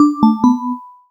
sub announcement; comms

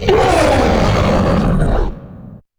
die, creatures; combat; ryuchi; CosmicRage

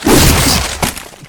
flesh, combat, abilities, big claw

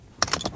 waist; CosmicRage; wear

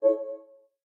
music fm; comms

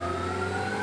accelerate, vehicle

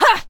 attack, combat creatures default she; CosmicRageSounds

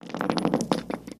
grenade, combat

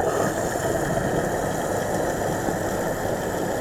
subatomic fuel pump, ships; CosmicRage; CosmicRageSounds

